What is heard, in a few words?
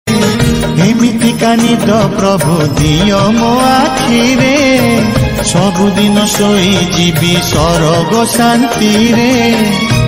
Odia Song Ringtone